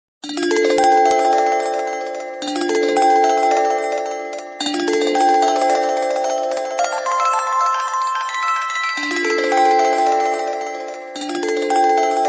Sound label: samsung chimes ringtone; samsung galaxy